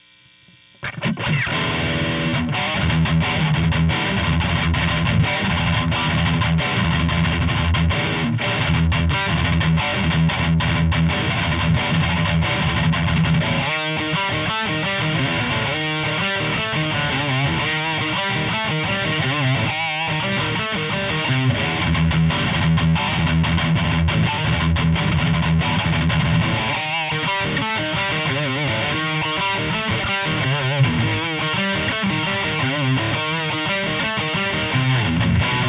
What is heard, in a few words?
riff